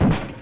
Dawg Smash; Hamsterball

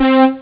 syn brass, stabs

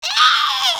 Harpy; Hellboy

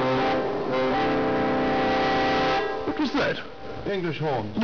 E HORN

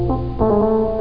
pre trombone; How Many Bugs in a Box

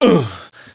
FALL; SINDEMO; player; blade

